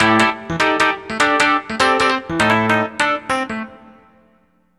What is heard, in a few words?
Electric Piano, Loops, Keys